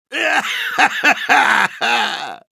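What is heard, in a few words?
laugh short, Russian; Короткий смешок 4; Soldier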